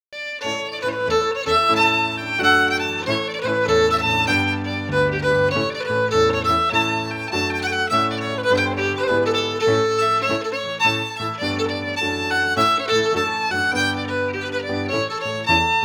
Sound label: FIDDLE FIDDLE; Jink & Diddle Book o' Tunes 2022